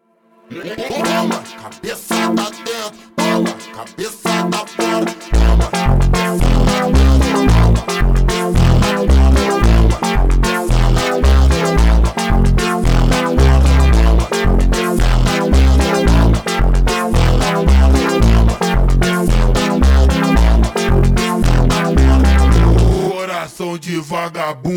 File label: Phonk, Ultra Slowed